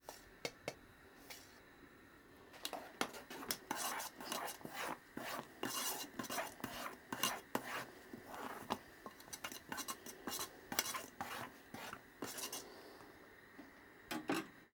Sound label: making coffee; human; NS Studios - ultra sound collection